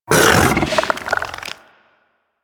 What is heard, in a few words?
Bug Vocal Tranquillized Grunt; Warframe; Hunting Critters; Inf K Drive Bug